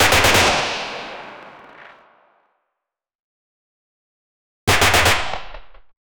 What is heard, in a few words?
ak 47 gunshot video game